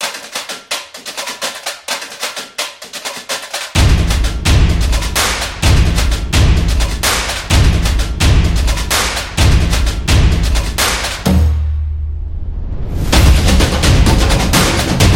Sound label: Samba Percussion; tribal dance